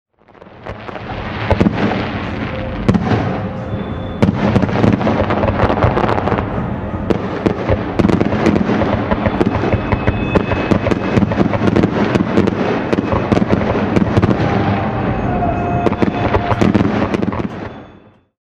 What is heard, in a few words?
Fireworks